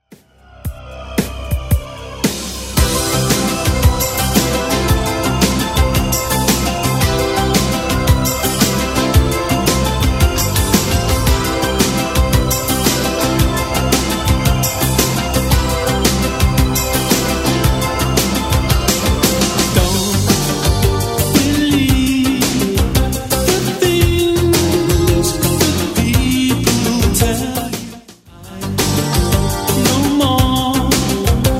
80s Redrum, 113bpm

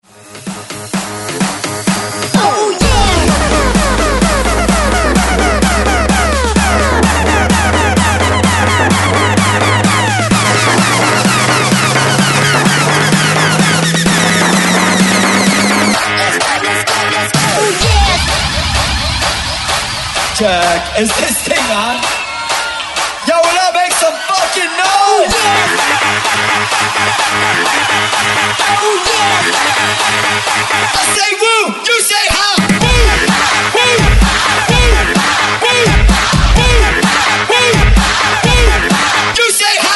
130Bpm BREAKMIX; DJDOLLS

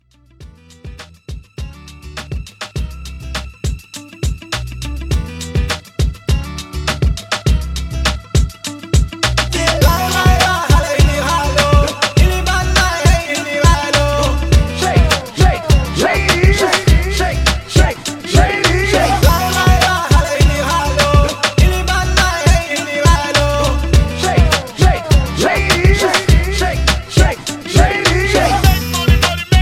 Multi Blend Partybreak, 102

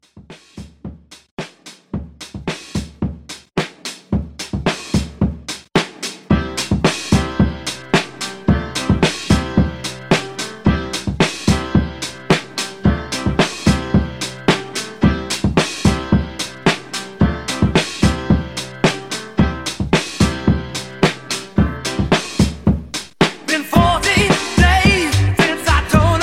70s Rock Re Drum 110

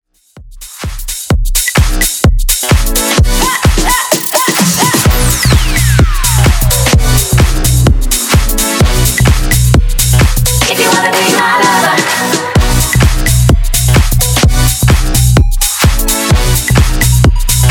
House 128bpm; Bootleg

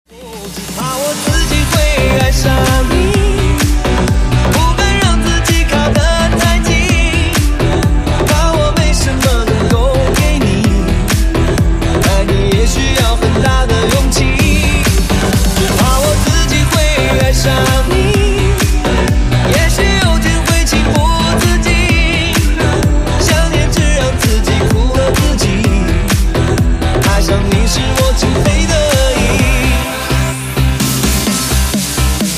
remix, 128 bpm

